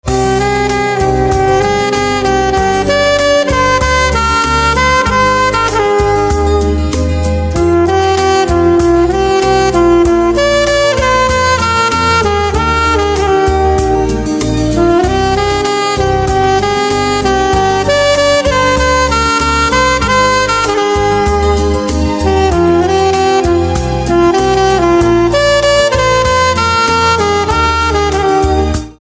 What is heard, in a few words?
Saxophone